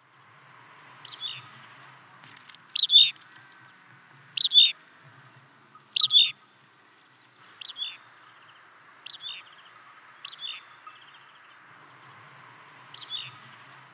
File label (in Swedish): Faltpiplarka; fagelljud